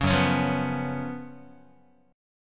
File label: Gb Major 7th Suspended 4th Gb Guitar